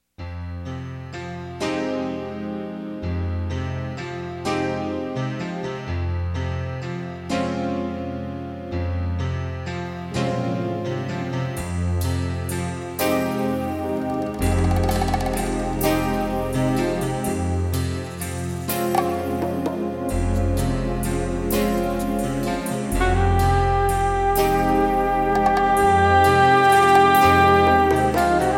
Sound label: Saxophone